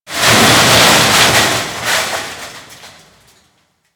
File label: Metal Debris, Shipyards; Warframe; Grineer Shipyards